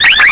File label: laser alert; vendetta